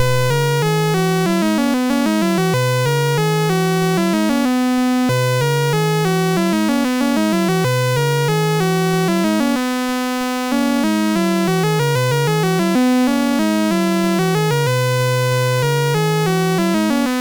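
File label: Number Tumbler, MOS 6581 SID chip music, games; hvsc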